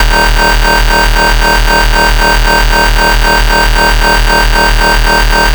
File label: FS (D#1), FightSynth; neuro; Stanza; FightSynth Multi